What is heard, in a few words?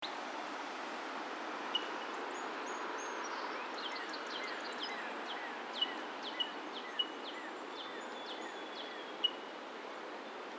Red Winged Blackbird